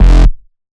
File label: Bass F, Bass Synth